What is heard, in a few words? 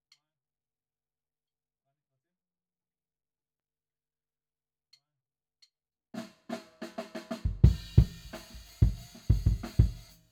Kick IN